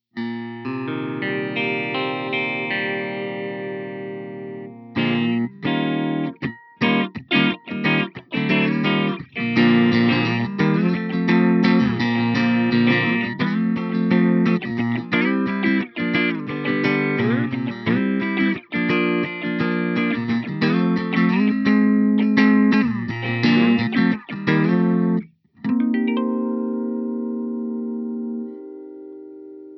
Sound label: open D tuning